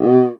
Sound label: PERC